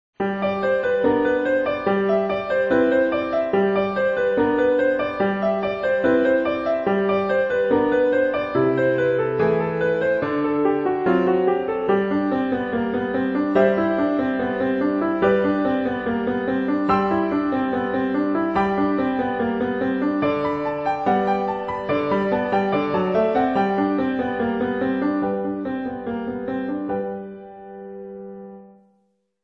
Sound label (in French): Exercice piano; Le Carpentier